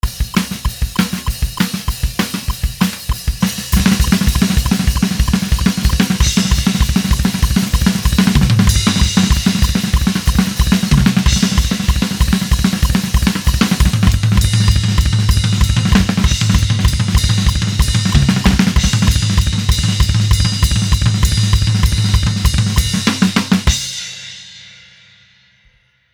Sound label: No click drums before